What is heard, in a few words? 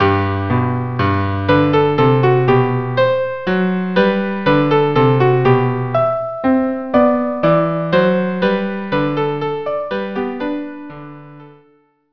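violin cello duo, minuet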